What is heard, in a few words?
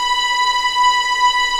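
B5A legato, violins; East West; The Ultimate String Collection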